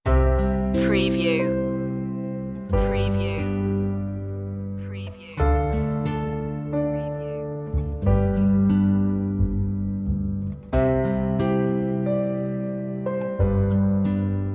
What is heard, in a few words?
sensitive calm underscore